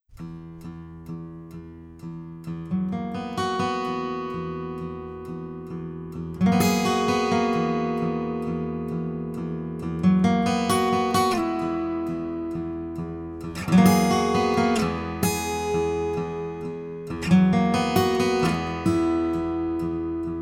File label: Hill Country Rain